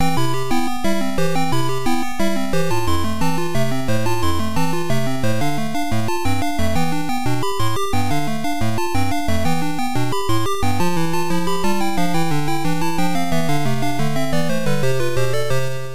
All Clear; Lode Runner III Golden Labyrinth; arcade; Irem M62

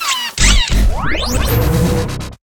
laser; Immortal commander

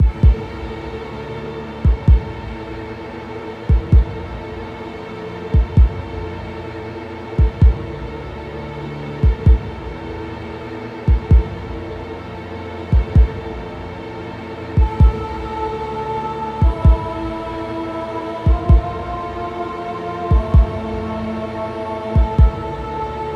Dungeon; Cube World

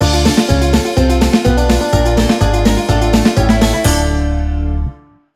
Sting Sad

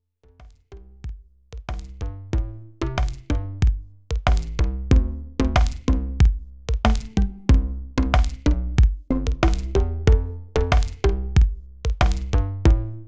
Diegetic Music, Pop Song; Ready or Not; Supporter OST; Valley

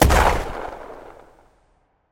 Explosion Small; jasem just another shooter with electronic music windows 2017

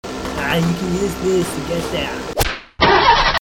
Airship; Fleeing The Complex

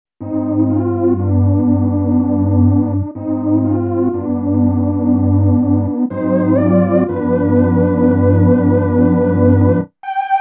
Church; Super Famicom Magazine Volume 02

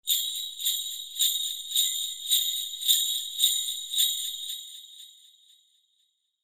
Solo Suzu Loop; Yu-Gi-Oh Master Duel

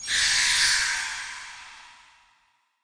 Btl Hitlrng Light; The Denpa Men; Android

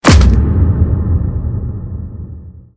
Newspaper Rustle

